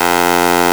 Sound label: Generator Working